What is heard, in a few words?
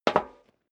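step dress boots metal dry single stair down, footsteps; schritte; menschen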